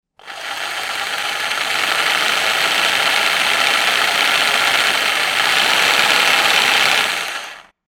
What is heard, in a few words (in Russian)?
zvuk jelektrolobzika